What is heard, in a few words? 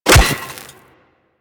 Melee Staff Impact Robotic Flesh; Warframe; Weapons; Staffs